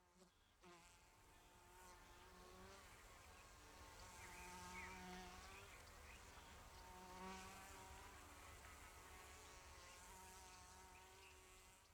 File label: insect, MidDay; FontainebleauDemo